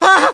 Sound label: scream